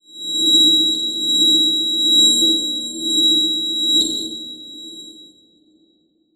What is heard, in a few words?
Title Transition Crystal Glow; Adobe; Production Element